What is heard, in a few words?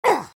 soldier pain sharp; vo